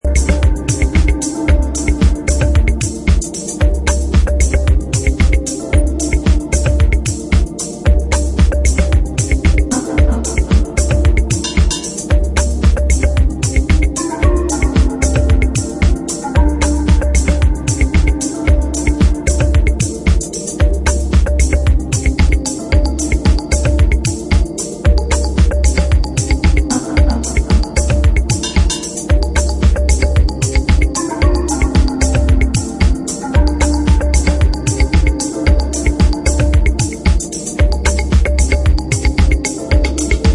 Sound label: Marimba